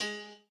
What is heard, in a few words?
piano